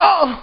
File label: hit; BattleSNDS